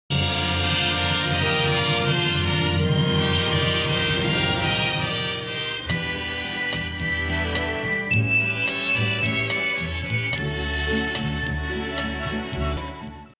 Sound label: swingin; bradybunch